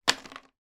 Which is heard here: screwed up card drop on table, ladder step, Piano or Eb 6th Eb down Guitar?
screwed up card drop on table